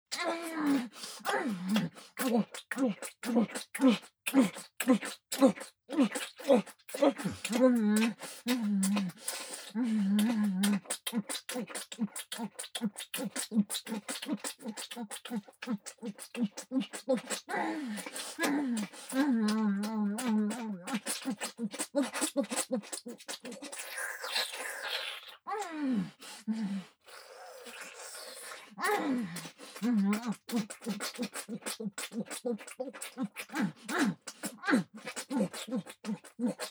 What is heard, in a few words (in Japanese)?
フェラ・激しい; アイリス